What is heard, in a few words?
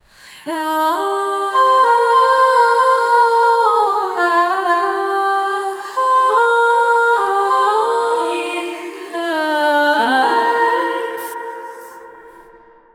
acapella of woman opera